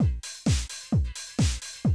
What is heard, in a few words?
130, Classic DrumLoops